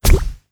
skill bubble

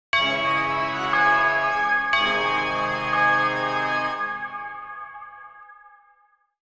pipe organ timer

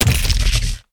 earth prick

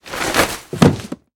bookshelf

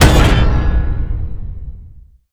hammer smash statue

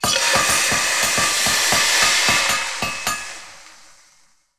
smithy

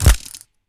imp bird arrow

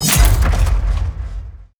spear stab

